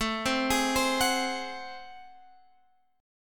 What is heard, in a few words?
A Minor Major 13th, down, Guitar, Open G